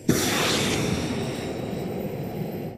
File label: jumpjet jump body; Titanfall 2